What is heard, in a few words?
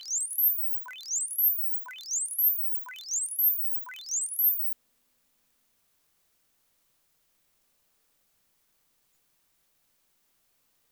bats